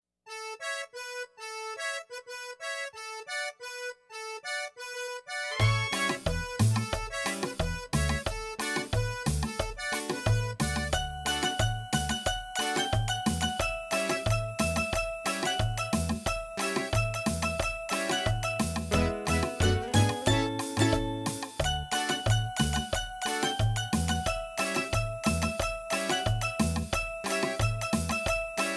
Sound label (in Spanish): (No Vocals)